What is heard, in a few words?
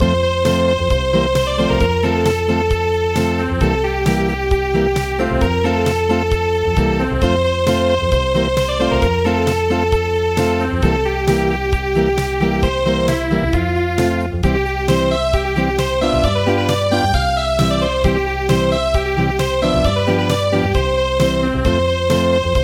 Polka Acoustic; midi; traditional